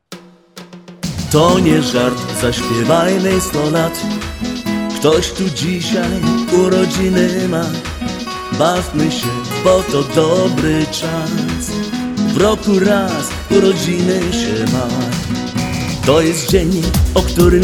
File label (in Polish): urodziny samba